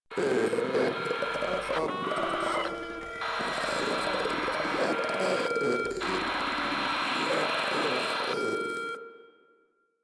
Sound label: Runner Idle; Enemies; Vocals; Warframe; Proto Infested